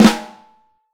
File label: FLAM, Vintage Snare Drum; Q Up Arts; Johnny.C's Vintage Snare Drum; 6.5X14 LUD